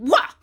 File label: rage